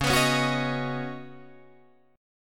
Db 7th Suspended 2nd Suspended 4th Db, down, Guitar Drop D; Db7sus2sus4; strummed